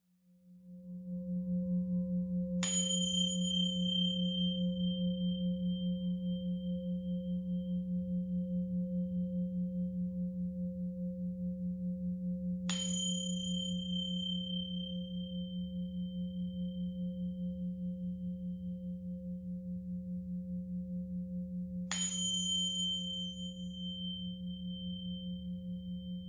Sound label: Himalayan Singing Bowls